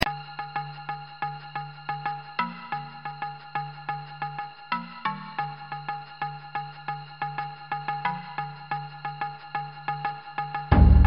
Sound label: Percussion; Police Quest SWAT